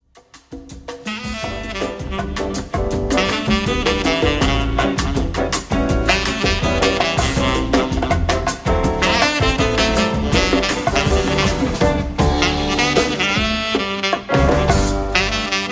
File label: quartet